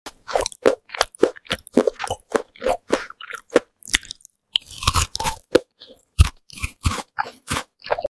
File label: Chewing fruit